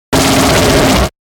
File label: Fast Harsh Blip; Beep; Production Element Imaging Element Accent Transition; Blastwave